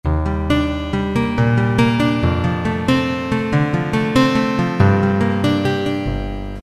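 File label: Acoustic Guitar Box; Roland PN-D50-02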